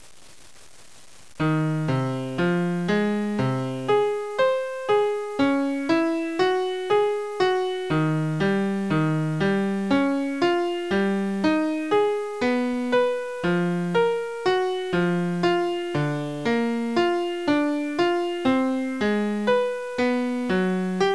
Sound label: fractal Note Generator